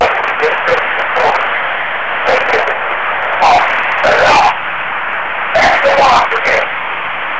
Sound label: devil chanting